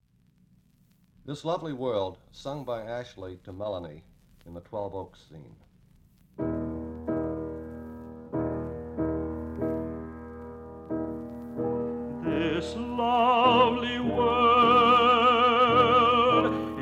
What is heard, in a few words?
this lovely world vocal